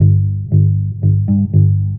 bass, Loops, Phonky

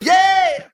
"yeah" sung

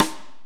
Rimshot, Snares

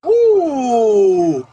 "ooh" male

